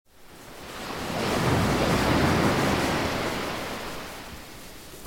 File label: waves crashing